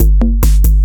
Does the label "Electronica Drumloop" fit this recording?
Yes